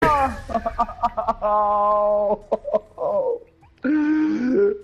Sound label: duel win rocket launcher